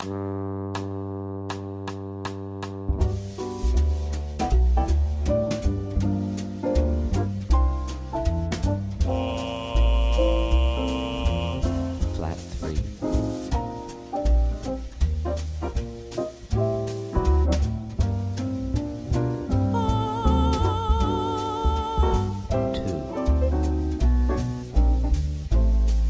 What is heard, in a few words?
Jazz Med; jazz standard ear training